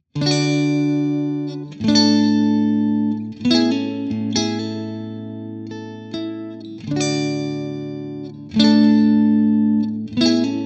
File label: clean; jensentone